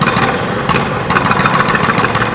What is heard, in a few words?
Jackhammer; Working Model Program